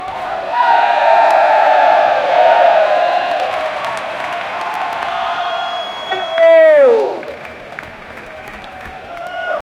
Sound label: nfl fans cheering in stadium